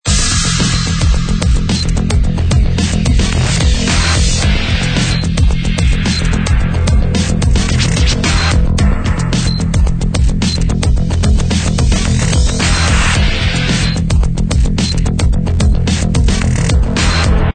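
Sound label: loop, Corporate Identity Intro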